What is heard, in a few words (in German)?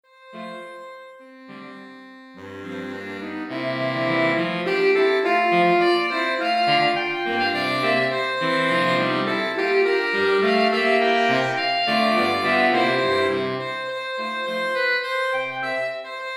saxophonquintett